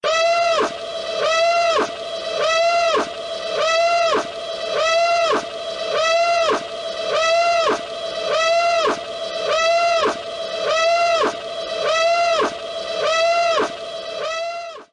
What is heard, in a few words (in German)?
Todesstern Death Star; alarm; star wars